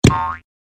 stop; piggySmart

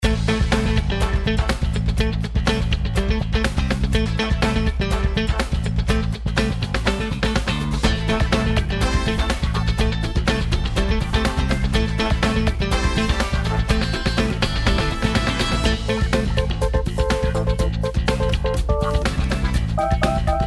bgm; lucky charm

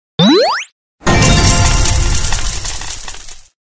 transition

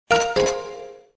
shop open

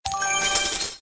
buy coins

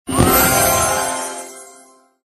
collect prize; daily prize

